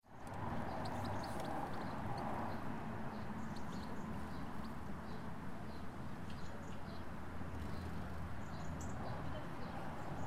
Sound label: birds bees traffic and no planes from a luton garden